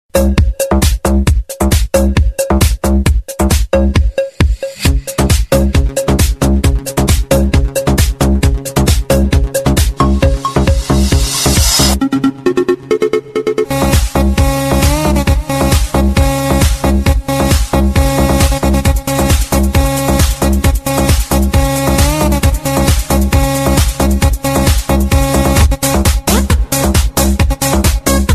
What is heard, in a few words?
New Trance